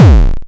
quake, Kicks Heartbeats

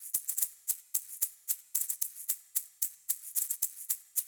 coin toss, drum loops

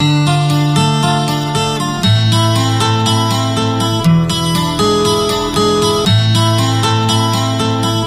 guitar, Loops

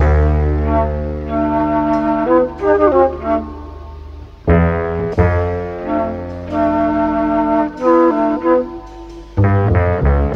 flute; Phonky

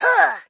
"huh" cartoon, voice; ooh ahh; heh huh

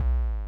Kicks Soft

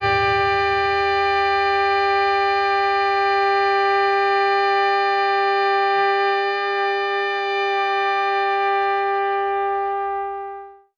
sorta synthy, Horns; Notes

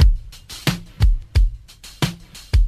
poppycock, Drum loops Boombap

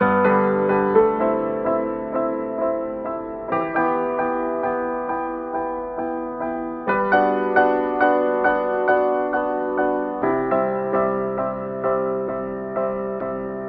piano, Loops Sad

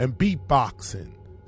beatboxing; actions

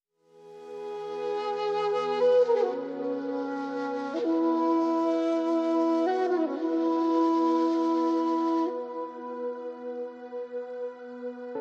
flutey dojo

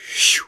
whew; ooh ahh; human

